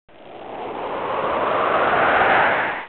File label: PASSING; Spanish Net